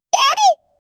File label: a question comes out of; animation